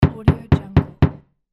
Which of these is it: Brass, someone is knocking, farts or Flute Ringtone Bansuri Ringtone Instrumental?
someone is knocking